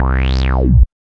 BASS

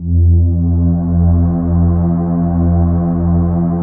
Atmo Pads; Best Service; XX Large

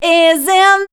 voice spectral, ly short

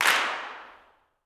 CLAPS, Gospel

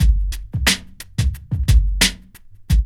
LOOP; E-Lab; X Static Goldmine 2; akai

